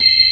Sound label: B3, Drawbars